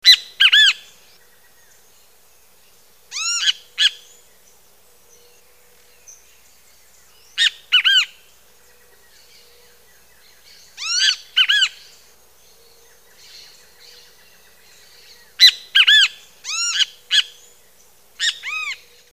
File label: Great Kiskadee